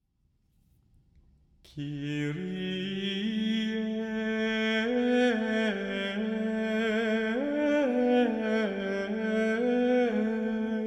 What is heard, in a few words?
Kyrie Missa de Angelis, chant